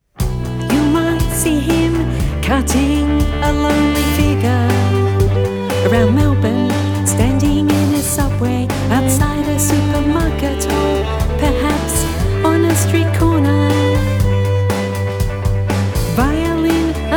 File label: violin